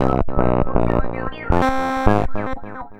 Bass, Futurist Drum & Bass

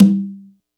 kit, Yamaha Motif-ES8

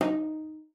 CELLO FINGER D#4; Xsample VOL-5

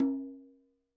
CH s, KILLER CONGA

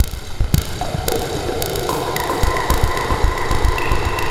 locust beat; FRENETIC FRACTAL GROOVES

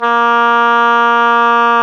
oboe, Roland Orchestral Winds; Oboe Brth; Oboe 1

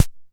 Snare, Jomox Xbase-09

